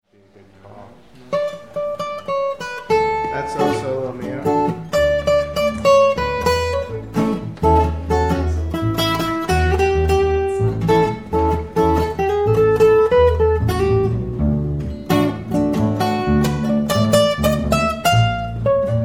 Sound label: O Solo Mio